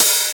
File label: crispy open hat sample d key, open hat sample tuned to the D note